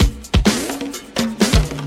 Fill Ins 128BPM; Loops; Vengeance Samples

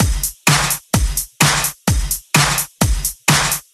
House Beat, 128 BPM

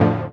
Synths D; Vengeance Samples

